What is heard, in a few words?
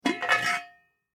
Household Pot Lid Lift Open; Kitchen